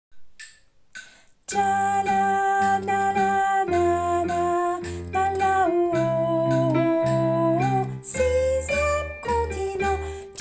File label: basse